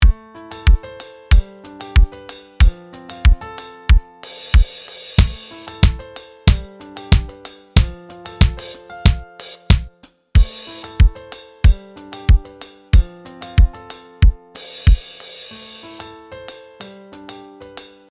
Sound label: Kick